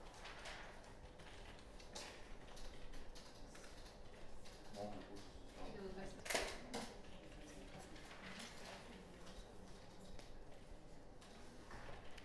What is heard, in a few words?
bank, ambience; NS Studios - ultra sound collection